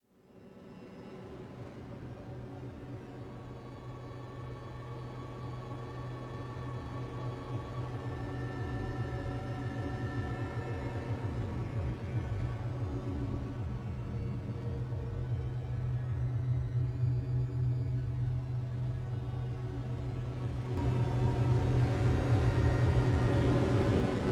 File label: Music Gameplay State Neutral; Sifu; H1 Level Area=Secret